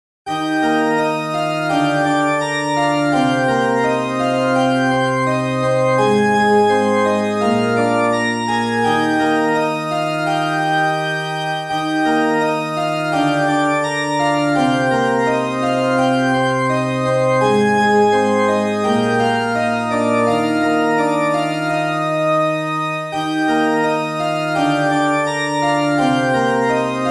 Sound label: org church